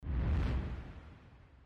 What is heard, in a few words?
wuf